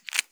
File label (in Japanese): 深めにストローク, ピストン運動 激しめ